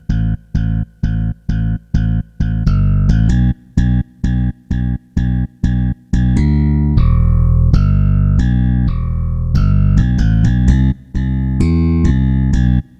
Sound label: bass